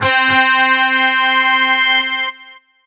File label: Roland GR-1 Synth Guitar Lead C5